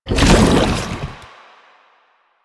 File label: Chem Strike Goo Layer, Footsteps; Warframe; Lasrian; Lasrian Soldiers; Foley; Lasrian Chem Strike Nox